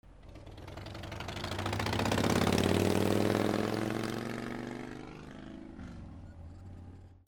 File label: VEHICLES